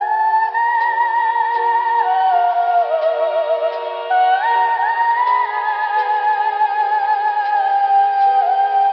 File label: voice, Sad